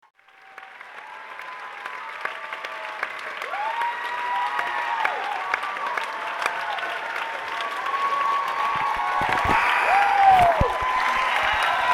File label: encore break (live solo); Somerville MA